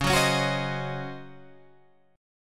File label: Db Major 7th Suspended 2nd Suspended 4th, Db, Guitar, Open G